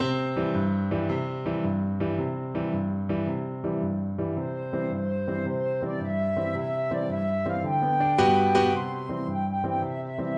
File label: flute quartet